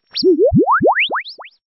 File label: Stinger; STINGERS